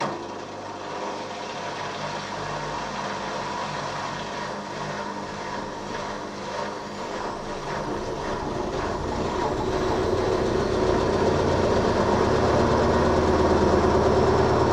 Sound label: Spin Cycle, washing machine